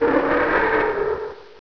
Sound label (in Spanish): RUGIDO; RPG Maker; Legend of Heroen v1.20